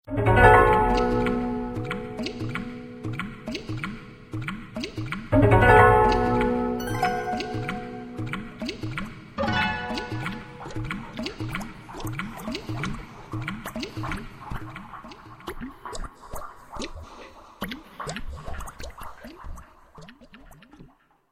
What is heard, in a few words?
watery japanese